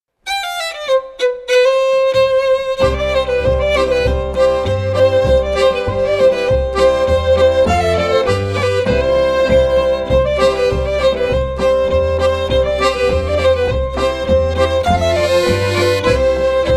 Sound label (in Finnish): polska; Kolmen polska; tanssi